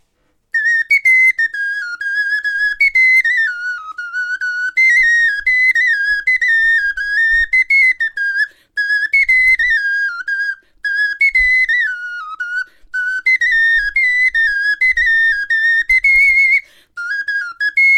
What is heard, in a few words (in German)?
melodie